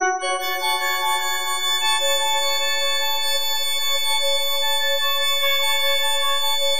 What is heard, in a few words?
FM F# MIN; Bolder Sounds